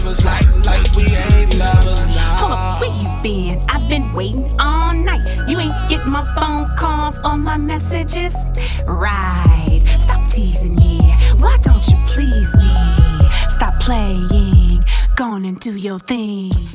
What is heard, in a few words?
romantic film samples; GRIND FORCE RECORDS